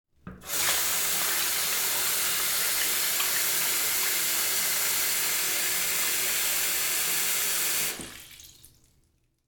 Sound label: Bathroom Faucet